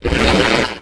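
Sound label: droid; Star Wars Knights Of The Old Republic I; XBOX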